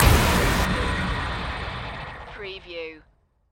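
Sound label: Production Element Hit Warble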